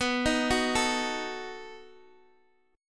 B 7th B down Guitar DADGAD

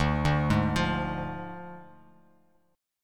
D Diminished D, Bass Standard; arpeggiated

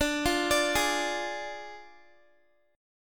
D Diminished, Guitar; arpeggiated; 4F,4A,5C,5G,5C,6E

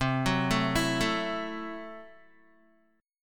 C 6th Flat 5th, C, down, Guitar; arpeggiated; JGuitar

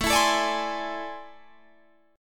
A Minor Major 11th, down, Guitar, Standard; strummed; AmM11 Chord; JGuitar

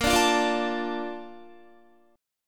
A sharp Major 7th, Guitar; strummed

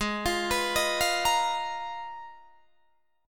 Ab Minor 6th Add 9th, Ab, Guitar, Drop D

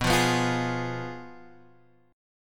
F Diminished B

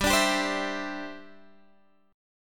D 7th Suspended 2nd Suspended 4th G

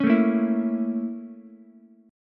Bb Minor Major 7th Flat 5th Bb, Ukulele; BbmM7b5; strummed; JGuitar

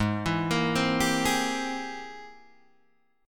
Ab Augmented Major 9th Ab Guitar Open D

